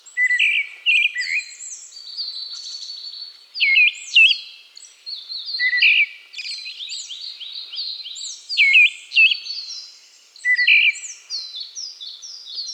Eyebrowed Thrush Turdus obscurus

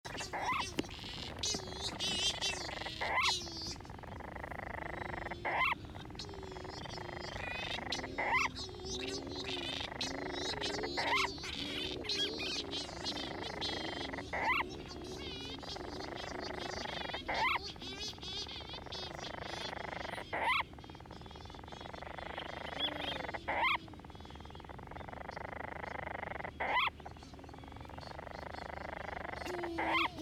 Monteiros Storm Petrel Oceanodroma monteiroi

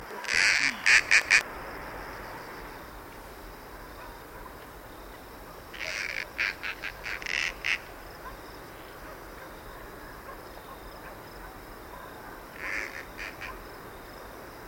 Marsh Owl Asio capensis

